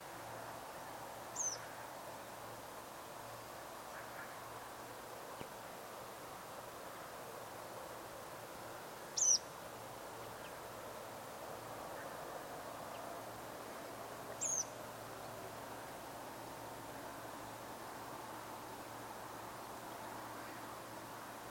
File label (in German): rotkehlpieper